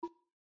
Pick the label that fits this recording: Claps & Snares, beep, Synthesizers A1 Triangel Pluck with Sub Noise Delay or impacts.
beep